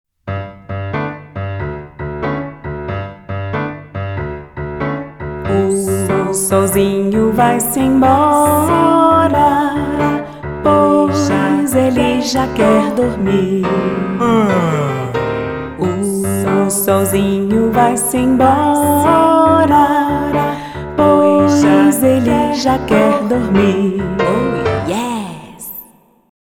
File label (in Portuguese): Vocal; O Solzinho; Violino para os Pequeninos